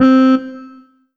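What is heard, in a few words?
Korg 01W Muted Trpt C4, muted trumpet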